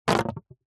blow air into tube thin water gurgle dribble short burst; zapsplat